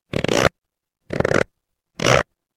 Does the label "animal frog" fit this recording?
Yes